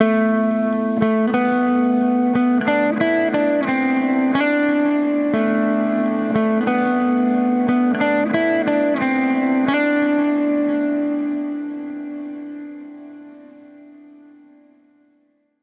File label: Melodic Guitar Loop 90BPM Am